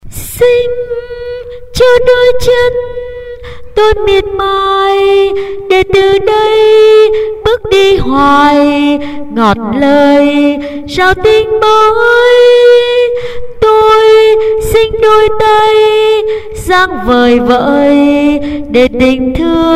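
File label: soprano alto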